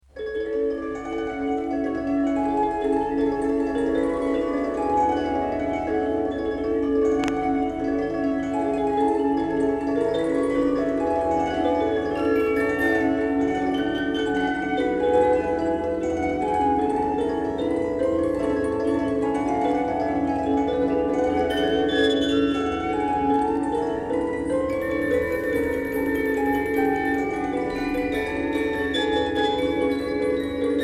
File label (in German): Musiktisch